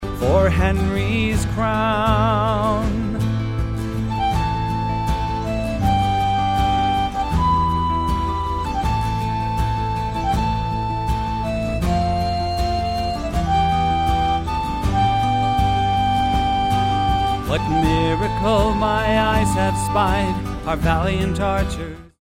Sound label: Flute; Agincourt